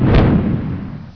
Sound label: booom